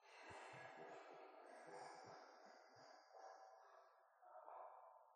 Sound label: laugh; The Joy of Creation Halloween; Verblaugh 4